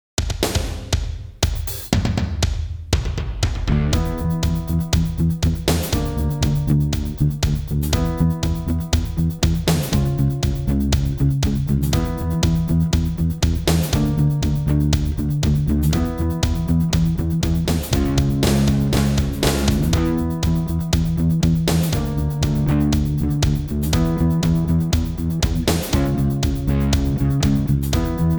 Outdoors; Dark Sun Wake of the Ravager; ms dos

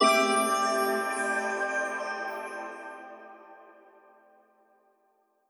Crystal Activate; Archaica The Path of Light; PS4 Switch Windows Xbox One gamerip 2017

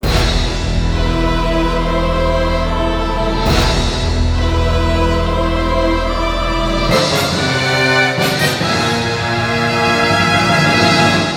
Bgm In Battle; Yu-Gi-Oh! Cross Duel

